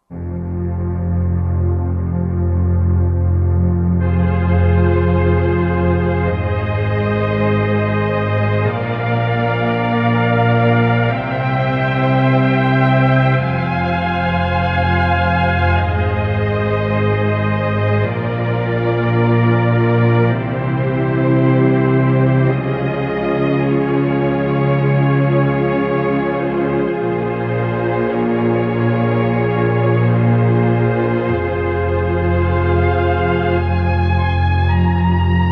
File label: Tron Strg ENS; '60s & '70s; Roland SR-JV80-08